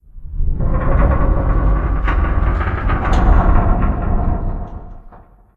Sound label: Metal Stress